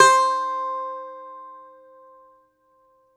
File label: DOMRA, Russian Strings